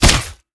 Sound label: beat blunt